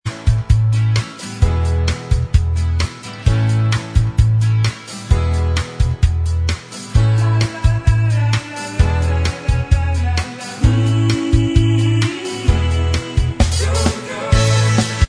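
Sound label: backing vocals, backing track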